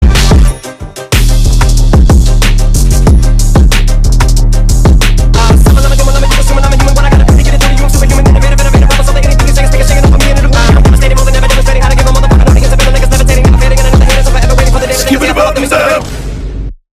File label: goofy song